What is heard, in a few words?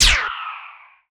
Bow ARROW flight; Cielonos